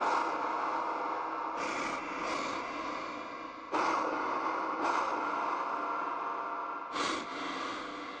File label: Diner Amb OtherWorld Breath Loop; horror and atmosphere fx; Silent Hill Downpour